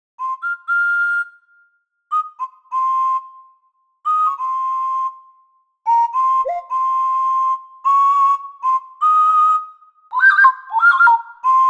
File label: Solo Flute